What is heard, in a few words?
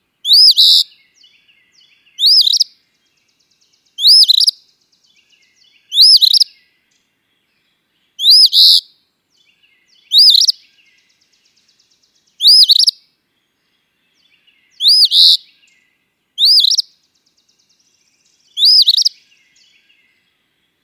Eastern Phoebe; winnipeg